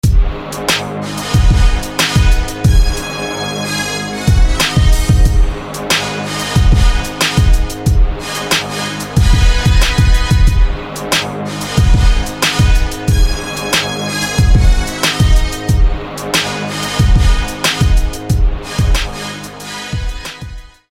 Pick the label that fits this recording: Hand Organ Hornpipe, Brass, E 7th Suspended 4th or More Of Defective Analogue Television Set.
Brass